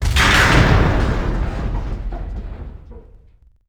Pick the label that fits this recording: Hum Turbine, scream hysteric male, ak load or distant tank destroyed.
distant tank destroyed